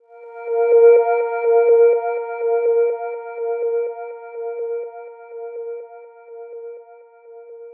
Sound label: Post Riser Tiles